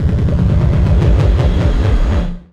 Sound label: HOOVER, Anime Sound FX, SCIFI